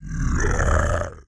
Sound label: Were Bear Idle